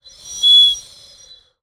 Birdwhistle, clay, painted, dry, airy, wispy, swell, smooth, med strong; Eiravaein Works; Vaeyan IV